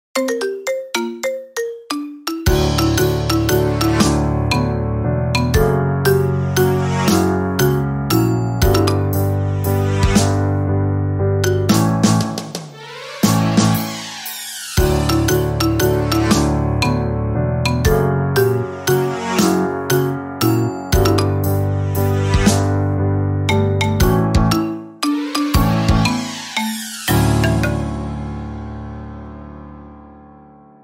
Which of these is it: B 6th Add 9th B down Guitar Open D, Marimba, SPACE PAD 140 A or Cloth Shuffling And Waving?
Marimba